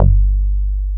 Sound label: CHUNG BASS G1